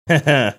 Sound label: heh heh